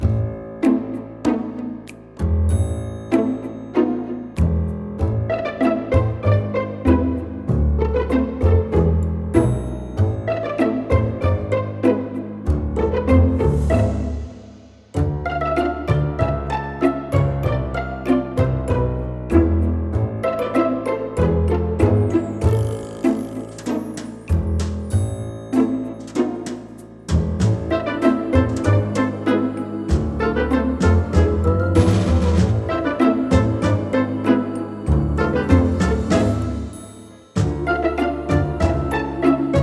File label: Halloween Spooky Night Loop; Super Mike Classic Adventure Game